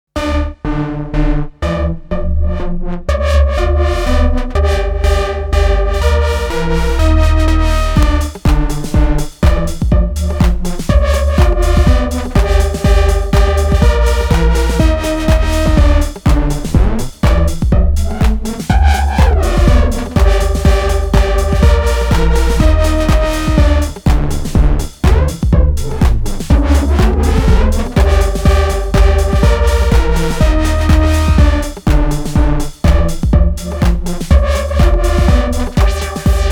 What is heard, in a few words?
motion seq vs manual detune